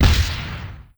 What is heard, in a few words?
small explosion